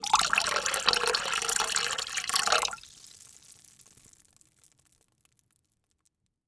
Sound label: Liquid Water Pouring Into Water In Plastic Bucket Light Short Fast